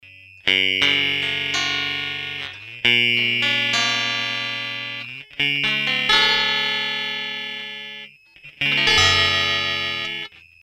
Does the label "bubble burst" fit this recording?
No